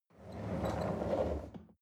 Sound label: chair wheel roll on floor soft